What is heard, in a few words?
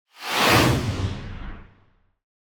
Whoosh Big Air; Hollywood Edge; sound designer's toolkit v2